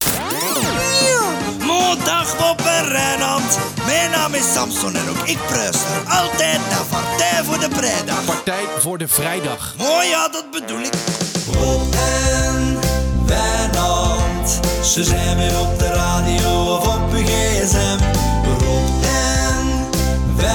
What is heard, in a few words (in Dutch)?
Jingle; Samson; 3FM; Partij Voor De Vrijdag